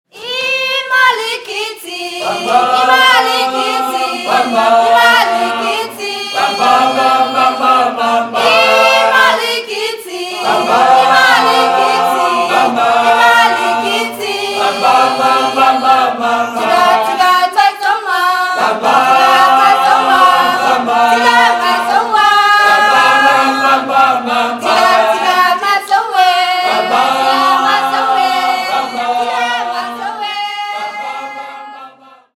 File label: choir nam